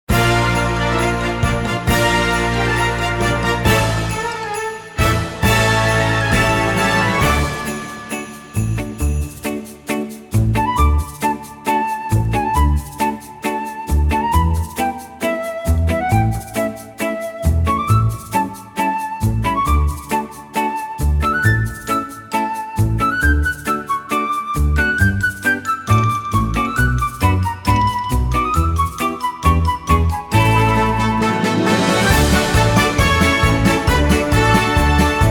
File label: sleigh bell jackpot